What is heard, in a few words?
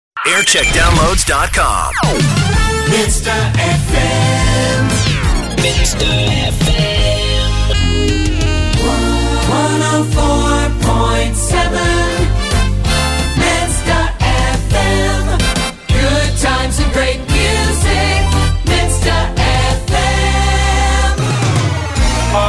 Jingle Montage; Minster FM; JAM